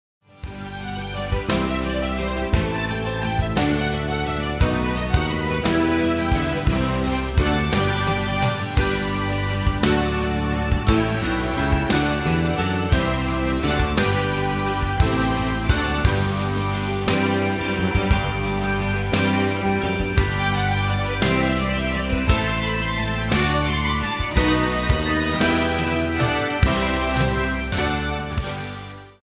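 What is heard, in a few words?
karaoke instrumental we wish you a merry christmas; christmas favorites; disney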